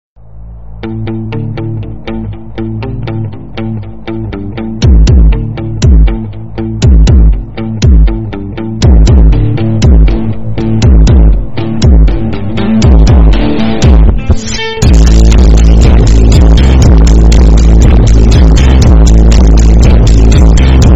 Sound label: bass boosted; home depot